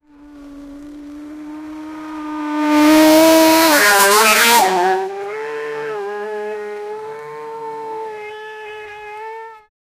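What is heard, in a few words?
jaguar and mustang high speed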